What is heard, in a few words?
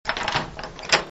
door